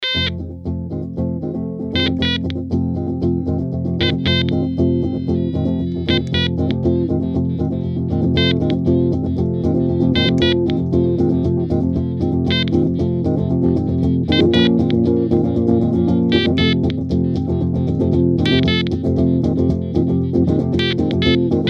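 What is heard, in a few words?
Cocamo without vocals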